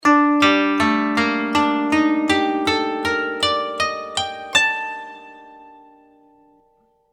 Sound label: koto